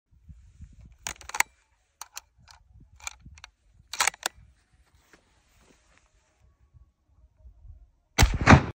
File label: sniper